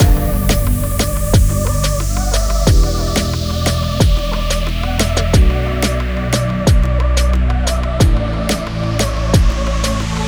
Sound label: Epic Leads for Serum; Dharma Worldwide; Serum预设包; EDM